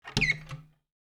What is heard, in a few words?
cabinet door open